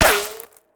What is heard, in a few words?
Bullet Ricochet; Sound Ideas; Guns